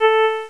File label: violin; MicroWorlds 2.03